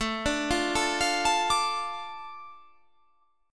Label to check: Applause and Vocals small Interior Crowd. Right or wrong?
Wrong